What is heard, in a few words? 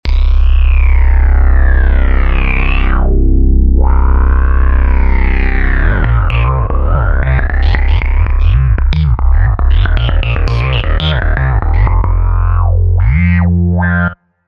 Roland V-Synth; Retrospectiv; Factory Patches